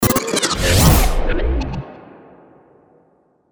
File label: squirely stab